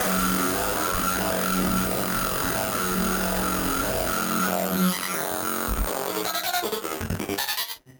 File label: Battered Synth; malfunctioning synth samples